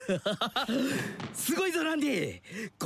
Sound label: laugh